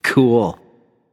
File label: happy; smite; voicepack; osiris